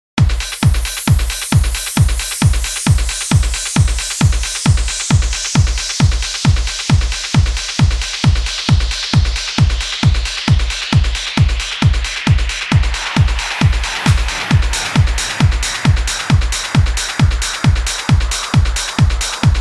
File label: Soundscape Sessions; Pure FM